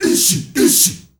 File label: ISHIISHI R, GRP CHANT, Heart of Africa; Spectrasonics; Heart of Africa 1